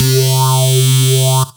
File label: C Synth, One Shots